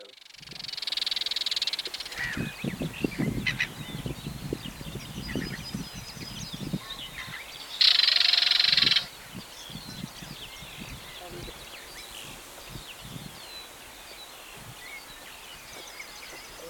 hoksangare; fågelljud

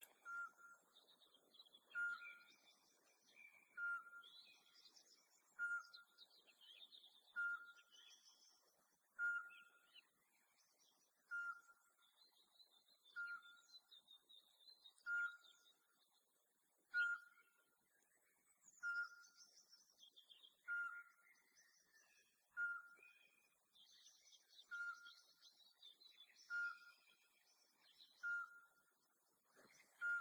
sparvuggla; fågelljud

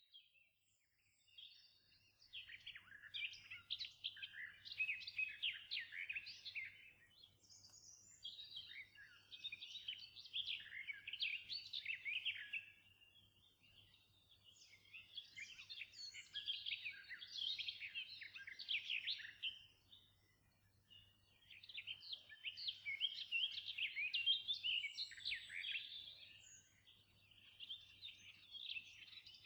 sybor, fågelljud

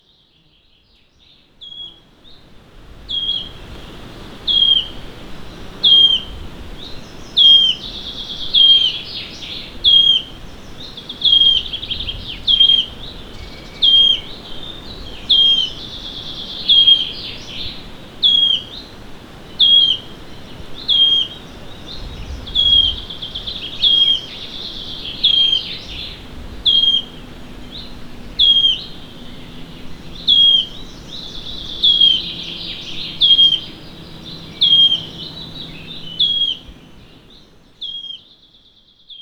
grönsångare; fågelljud

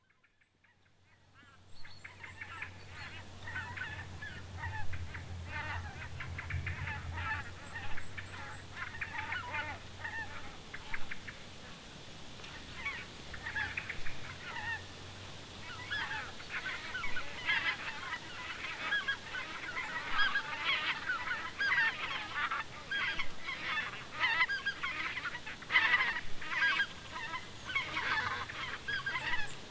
bläsgås; fågelljud

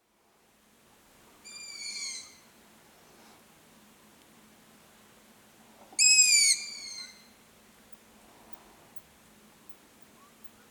hornuggla; fågelljud

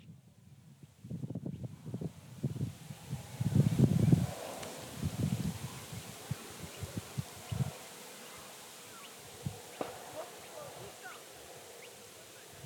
småfläckig, fågelljud

